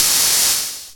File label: MPC cymbal; MPC Electronics The KIT